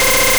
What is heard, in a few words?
Leer